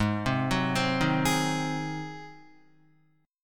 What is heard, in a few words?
Ab 7th Ab Guitar 4F,4A,5C,5G,5C,6E; Ab7 arpeggiated; JGuitar